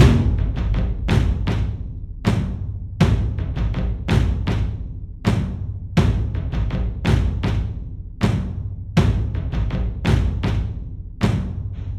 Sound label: Drums; For Honor